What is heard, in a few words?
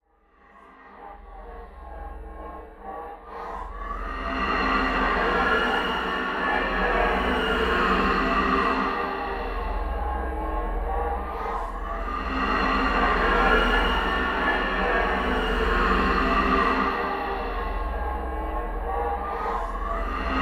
science fiction atmosphere space